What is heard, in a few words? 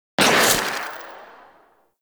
Crossbow Whoosh, Weapons; Warframe; Tenno; Garuda